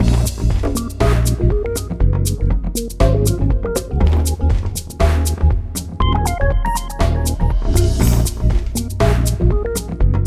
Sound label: Go on in, music beds